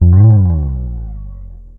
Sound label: Synth one shot Slide Up Gm, Bass; Slate Digital BASSHOLE Sample Pack